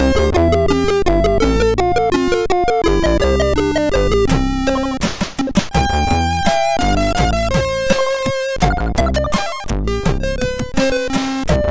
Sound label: Empty Tune, MOS6581; MOS6581R4; hvsc